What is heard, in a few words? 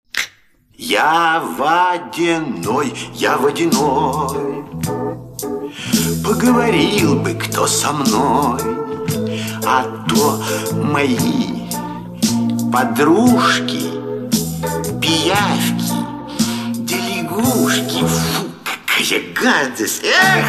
song